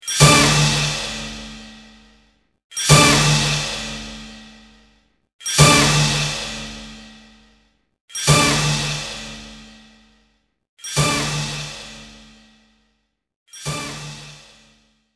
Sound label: Drum roll end; Buzz!: Brain Bender; PSP